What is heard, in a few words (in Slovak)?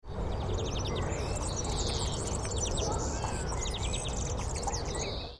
kanarik, zvuky vtakov